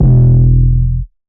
808 E; Cymatics

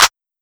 Clap

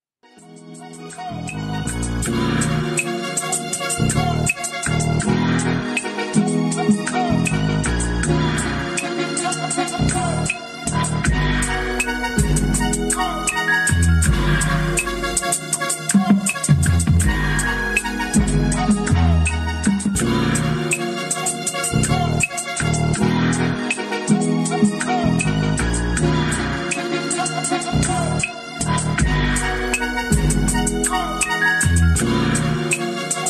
Analog; Analog 3

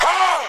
Ahhh Vox

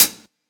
hihat, Closed Hats

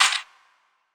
Clap

